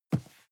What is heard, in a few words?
cardboard box medium grab slide; objekte